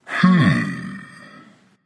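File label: non verbal thinking, voice; cernunnos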